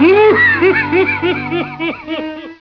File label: laugh; Droopy